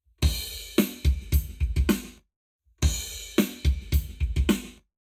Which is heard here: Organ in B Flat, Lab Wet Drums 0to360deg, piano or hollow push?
Lab Wet Drums 0to360deg